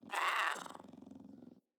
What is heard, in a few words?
Kitten Speak Playful; Enemies; Catbrow